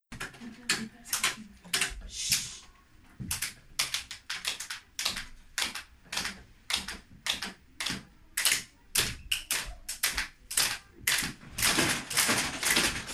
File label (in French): Musik préhistoire